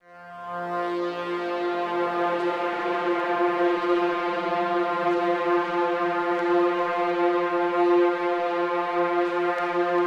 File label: Flytrap Overlay Drone